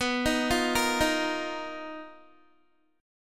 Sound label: B Major 7th Flat 5th B down Guitar; BM7b5 arpeggiated